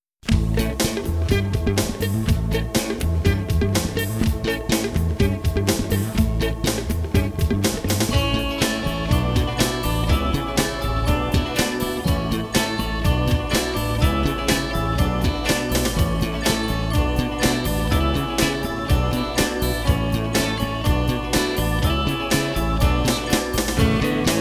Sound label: Instrumental